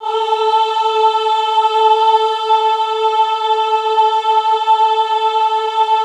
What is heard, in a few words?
AHH A3, Classical Choir; Best Service; Extended Classical Choir; AHH MIX